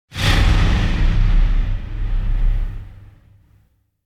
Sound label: Whoosh Low Hitting; Hollywood Edge; sound designer's toolkit v2